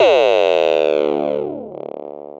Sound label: kick